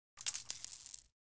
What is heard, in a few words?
pick n Click coins